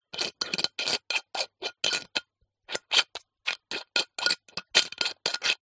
soda glass bottle metal lid screwing top movements; zapsplat